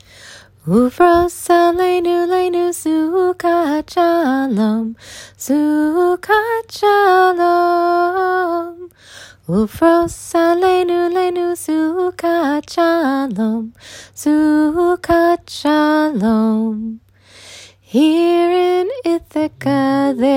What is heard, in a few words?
Ufros Aleinu upbeat